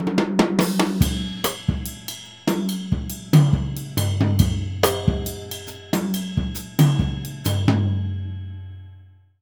Jazzistic; Univers Sons

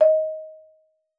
marimba D#5; Xsample VOL-4

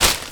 Foley Carrier Cymbal; essential drumkit

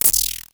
Klone Dual-Percussion-Synthesiser, DRUMHITS; KLoNe MONO; 300 Drum Machines

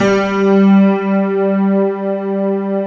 piano pad G3

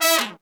Pop & Funk Brass, BRASS; Best Service ProSamples vol.25; AKAI

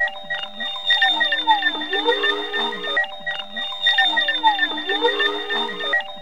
FEAR FX; X-Static Goldmine 2

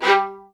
G3, LOUD VIOLINS; Q Up Arts - Denny Jaeger Master Violin Library

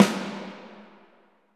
SNR, Orchestral Perc; Roland LCDP03

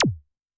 ET MooR L, Tom, Yamaha DTXpress-II

